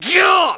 pain

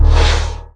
energy draw, weapons

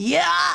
pain; frieza

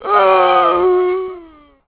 zombie idle, male

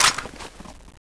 mp7 clip out

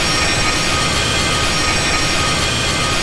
train screech

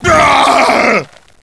pain, doberman

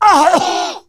pain sharp; tf2; demoman

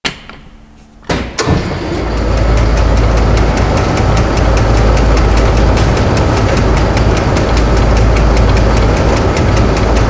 generator

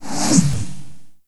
shoot; cyrax; monsters; scrag

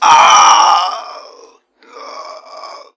death; doctor

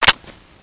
mp40 reload clip in

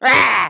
agh

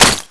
hit glass

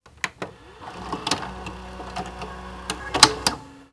save sequence

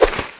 punch miss

